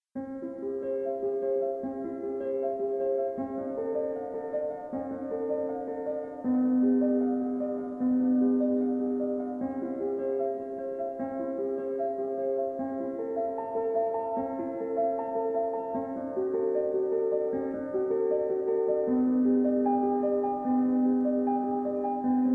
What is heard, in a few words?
Prelude in C